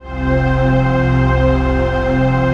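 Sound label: trance pad; Best Service; XX Large - Pads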